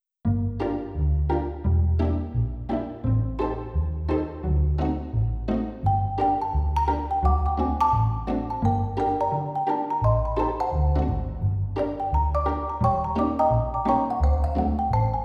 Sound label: Different Positions L to R; Createc